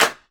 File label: Clap Electrified, Drums; One Shots; Ableton Live 12 Lite; Core Library